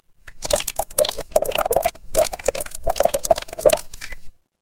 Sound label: water pour